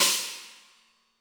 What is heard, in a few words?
HiHat & Crash, Roland TD-20; HiHats